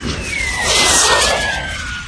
chem missile; Earth and Beyond; EA Games

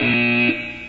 Foul, Pool

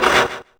saw

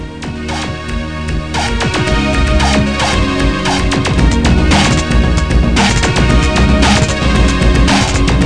new wave

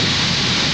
STEAM; angst

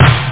snare drum

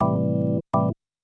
SYNTH HOUSE 125 A, Keys; eJay

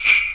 jingle B5; SoundMusicSys; General Magic

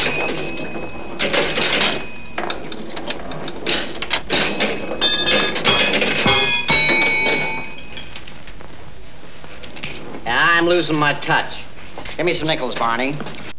pinball

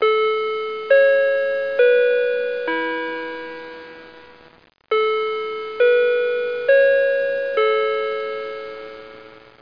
HOURLY; Software Vault (Sapphire Collection) (Digital Impact)

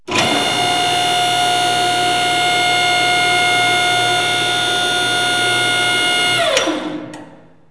hoist, industry equipmnt; KABOOM! - Wild and Wacky Sound Software

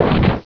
Rockets fired; Bedlam 2 1.1.1; Bedlam Sounds

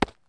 catch; hb6demo

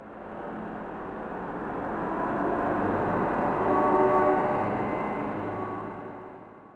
city, zones; Zoom3City

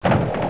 arm Swap; PC Gamer IT CD 48; Big bang

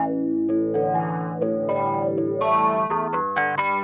ORGAN HOUSE 125 A; eJay; Keys

